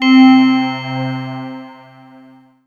Kawai K1r Reflection C3

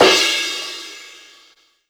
Kawai K1r Crash Cymbal

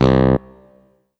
Yamaha TG500 WN Bari C2

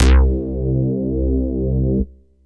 Yamaha EX5 Ana Chorus C2

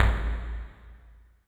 Rumble Kick, Drums - Kick

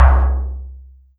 Yamaha V50 OilDrum

